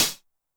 closed hat MOD Tune, 909; classic machines; 909mod

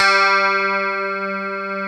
STRAT CH G3, EL.G; AKAI S-Series CD-ROM Sound Library VOL-2

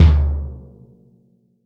KICK, JAZZ KICK

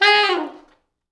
T Sax falls, Horns Sax; G#3; East West; Quantum Leap Horns Sax

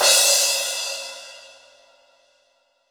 Crash, Cymbals; Roland BK-5

